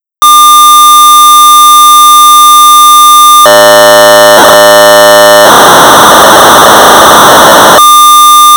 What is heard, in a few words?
Sorcerer 1200baud; Editr-BASIC Screen Editor 1.1; David Woodberry